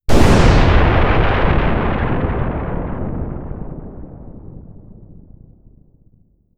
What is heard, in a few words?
explosion